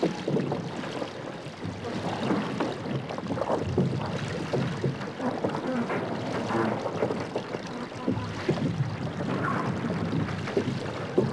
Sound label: Olive Press; Sid Meier's Civilization 4 Complete; Warlords; Resources and Improvements